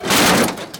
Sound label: metal crunch